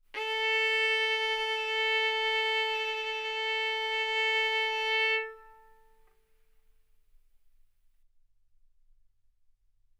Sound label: Violin arco mf sul A A4 B4 stereo